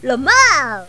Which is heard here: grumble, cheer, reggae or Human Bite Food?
cheer